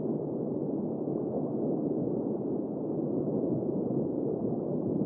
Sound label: water loop; Feeding Frenzy